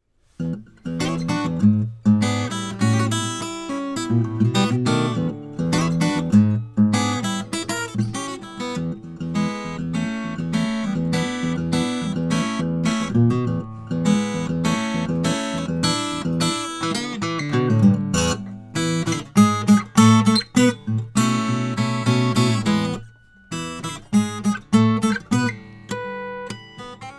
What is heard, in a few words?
guitar steel; mk012 omni